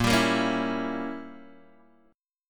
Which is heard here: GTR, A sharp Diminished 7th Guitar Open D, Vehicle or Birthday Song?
A sharp Diminished 7th Guitar Open D